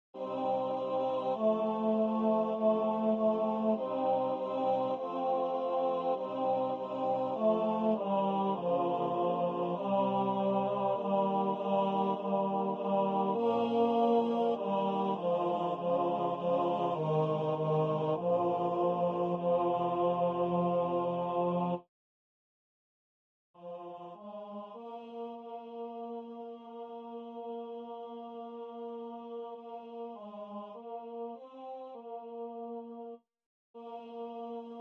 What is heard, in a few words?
tenor; sala ensayo